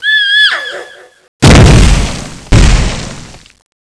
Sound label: death; cstrike; Teddy CSO